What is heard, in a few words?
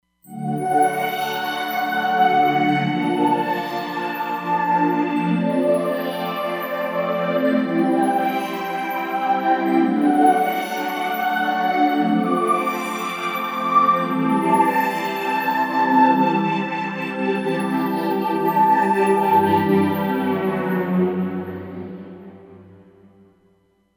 Ooh La La, Roland Fantom XR